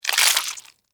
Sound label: Insect Squish, Guts, Footstep, On, Bug; Animal; Extermination; BlastWave